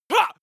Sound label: male attack groan short